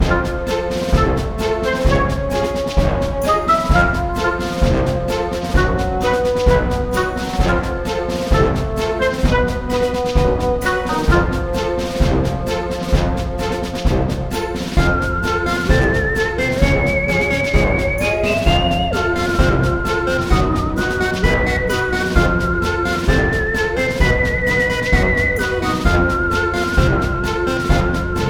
marching loop; spaceship hub

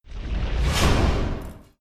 spin transition

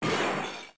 Jingles; Count Duckula in No Sax Please We're Egyptian; Amiga

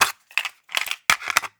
Cassette Rattle

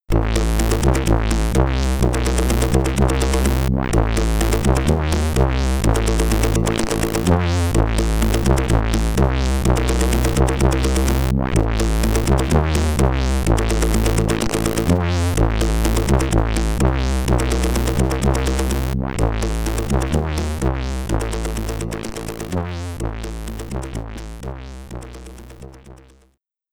The Temple (SID Stereo); Tarzan; Commodore 64

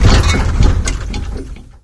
Vehicles Generic; unreal development kit

